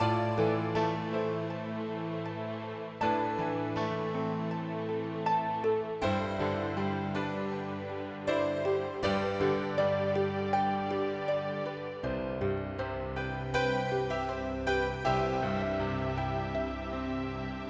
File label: piano; Casino Inc